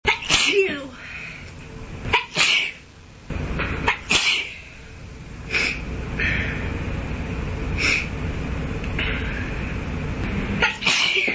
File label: sneeziest cold, female